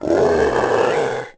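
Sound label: scream; The Elder Scrolls III Morrowind GOTY; XBOX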